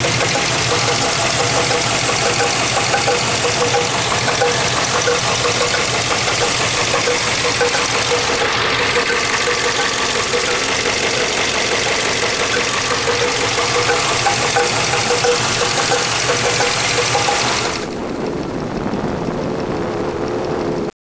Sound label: clunk; 95 yukon